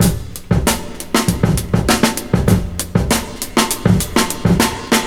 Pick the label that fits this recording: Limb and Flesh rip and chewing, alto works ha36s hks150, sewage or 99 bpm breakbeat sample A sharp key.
99 bpm breakbeat sample A sharp key